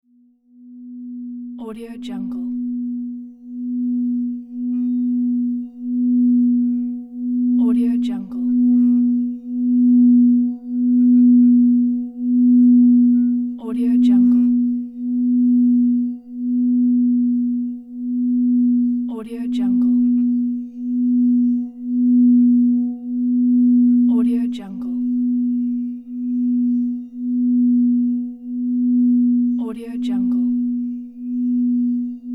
singing bowl 7 inch b note

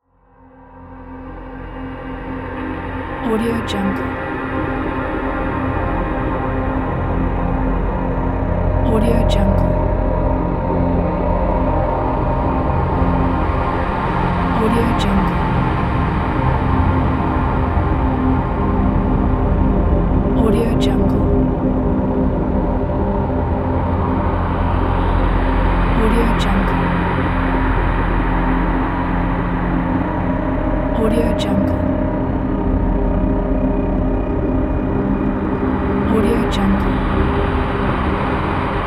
drone

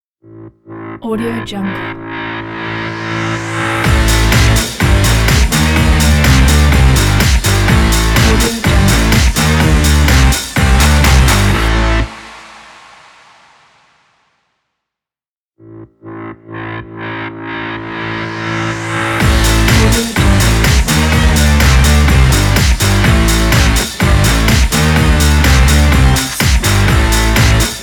powerful sport fashion rock logo

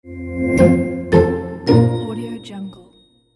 logo

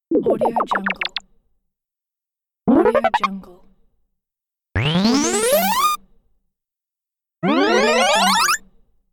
lively powerups; efxideas

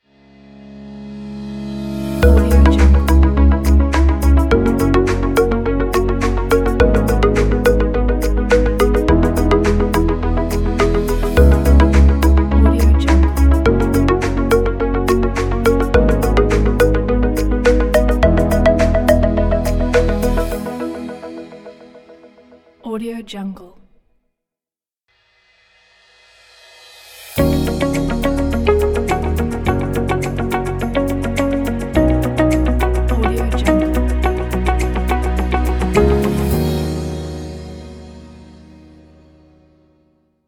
corporate uplifting ident pack

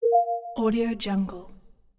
user interface; arcticroman

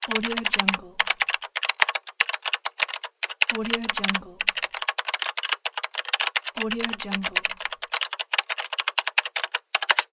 computer keyboard

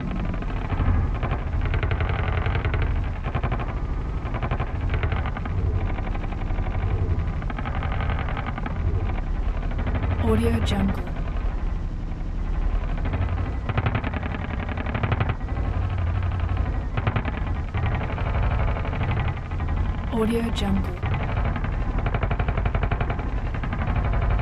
scifi spaceship hull mechanical stress loop; blazznet

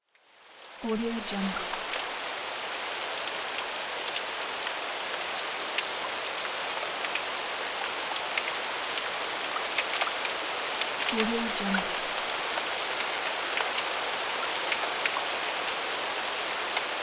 rain

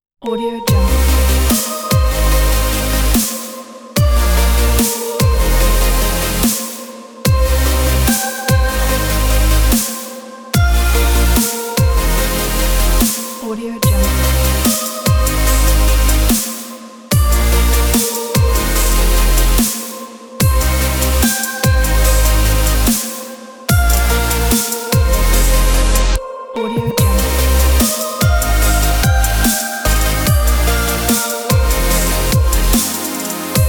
beautiful dubstep

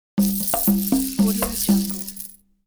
money win

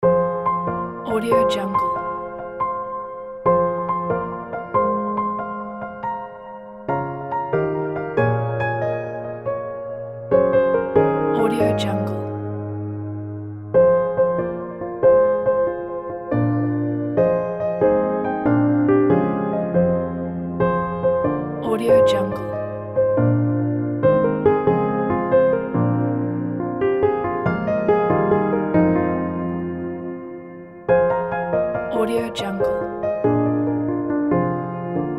cinematic romantic piano; cinemedia

